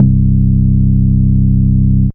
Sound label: Single Electric Basses, Dance Floor Bass